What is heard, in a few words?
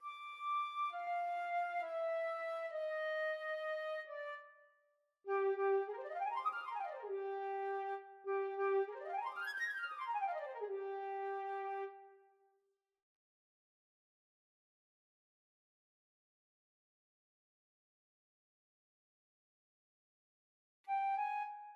flute